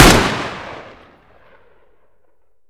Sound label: m16 shot